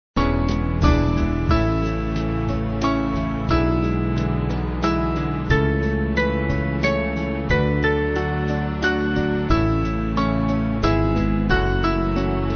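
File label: Band, Music Backing Files for Churches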